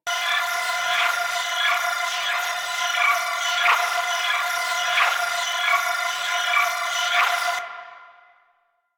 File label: Hub Railjack Hologram Loop; Tenno; Warframe; Ambience